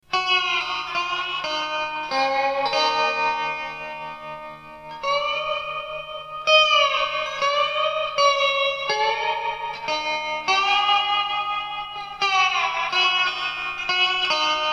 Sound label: tin guitar with effects; junkboxinstruments